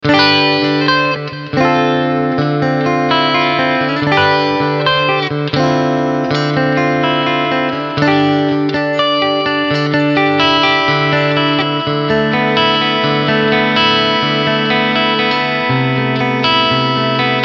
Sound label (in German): Cleansound Front und Mitte